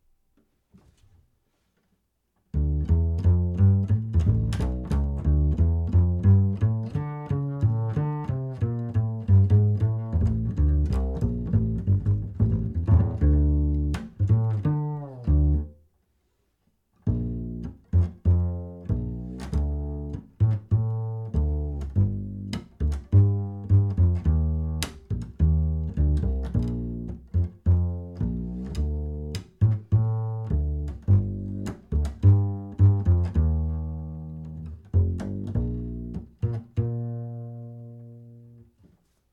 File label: string Bass SM57